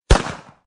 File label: newgun; Jungle-War